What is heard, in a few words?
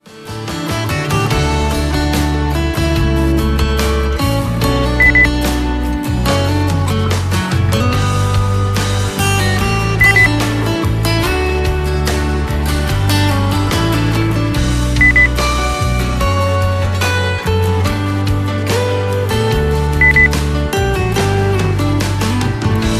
soft beep